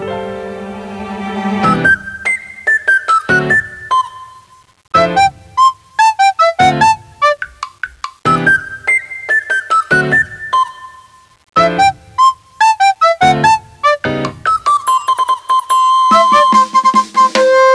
recorder training

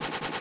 akimbo twirl

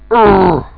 assault pain

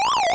move; boomario

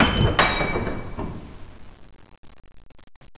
blip

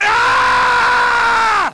sonic scream

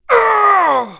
pain; darkwing

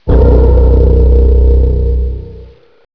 scare beat

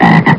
toad hunt; sven

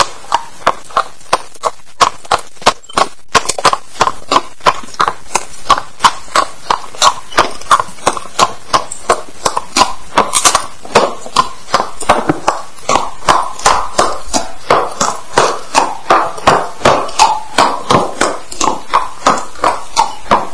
clop

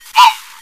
run

